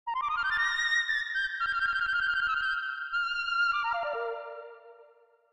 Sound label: flute